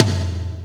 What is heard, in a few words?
Rock Tom, Percussion Hits